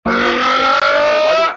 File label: yell